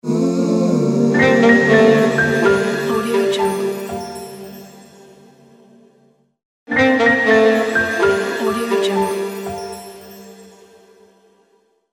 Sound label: jazzy stinger